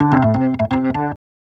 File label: Organ Lick; Ambient Grooves; Organs Licks